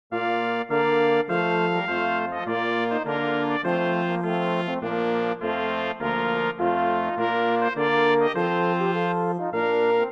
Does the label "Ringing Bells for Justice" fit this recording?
No